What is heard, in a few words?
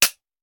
Hand Gun Foley; Sound Ideas; Guns